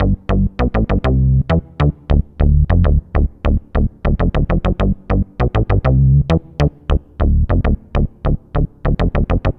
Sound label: bass